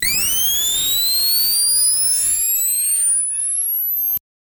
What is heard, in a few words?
a laser rifle charging up